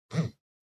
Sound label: Cell Phone Vibration Cushion